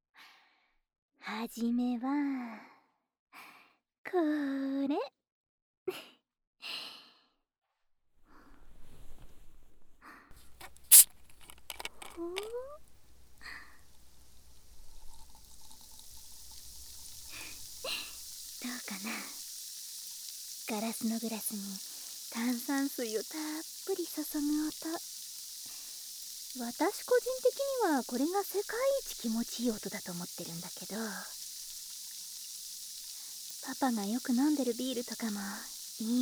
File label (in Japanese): シュワシュワ炭酸ASMR